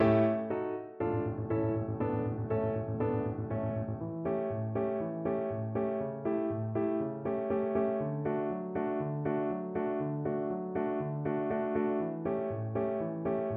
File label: thunder, violin